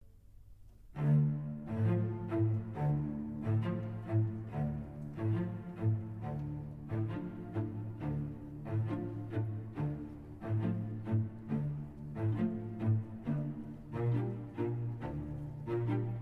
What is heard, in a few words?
piano